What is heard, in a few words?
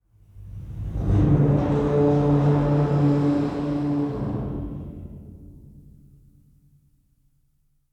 Tunnel Metal Open; Tunnel Nuendo Metal Open; inside